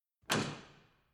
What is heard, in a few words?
Old UK Church Door Opening Big Latch Large Reverb